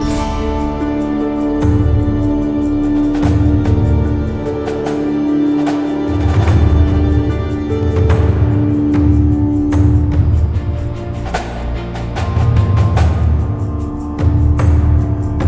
UI Menu Music; Orengall

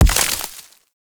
Mech Step Surface Foliage; Vehicles; Step Surfaces; Warframe; Nechro Mech

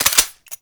Bladed Pistol Reload; Warframe; Weapons; Tenno

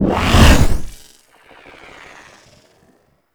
Necro Cast; Warframe

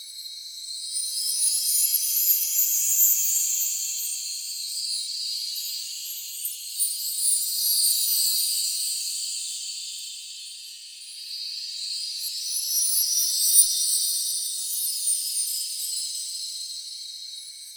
Hydraulus Active Loop; Warframe; Bard Quest

